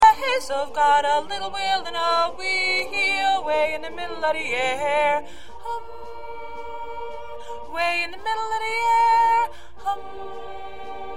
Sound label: Solo Alto